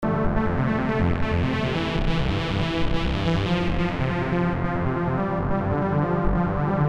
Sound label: acid bass; A deep, filtered synth.